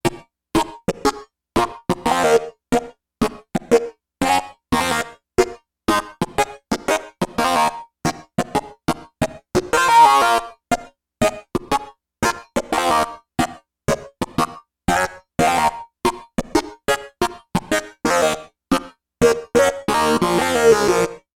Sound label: Dunk Lead; TEO-5